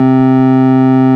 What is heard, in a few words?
ORG, Farfisa Clarinet; Roland LCDP09 Keys of the 60s and 70s 1